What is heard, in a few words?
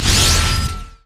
hammer smash; LoL; taric